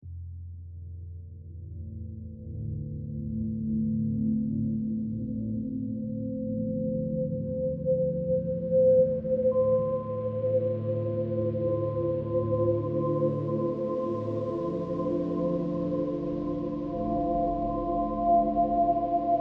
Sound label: D Min Pentatonic 70BPM; First Kontact; Loki